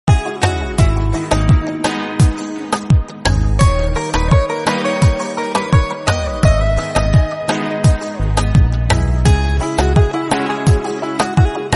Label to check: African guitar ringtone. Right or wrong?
Right